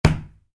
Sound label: Soccer Ball: Kick Ball; Football; Sports; Stuart Duffield